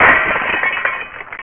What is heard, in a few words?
Glass broken